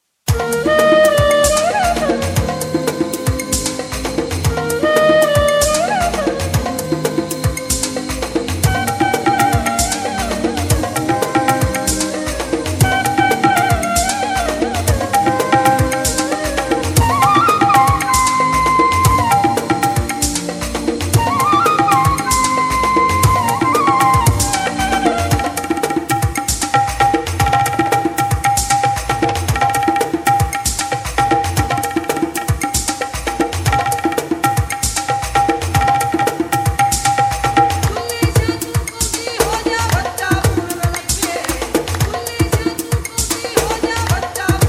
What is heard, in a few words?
ambient with nature, taniec z tabla